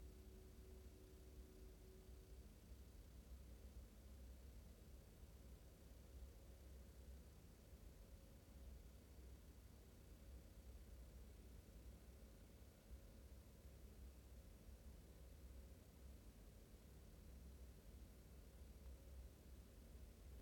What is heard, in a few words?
noise